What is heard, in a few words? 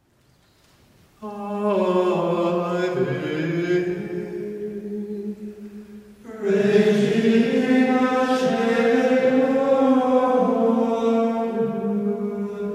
Antiphon Compline; Ave Regina Caelorum